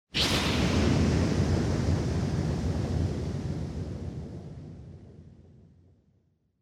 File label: Motion Speed Lines Long